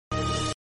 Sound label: bgm sad instrumental ringtone; Veer Zaara movie; SRK; Preity Zinta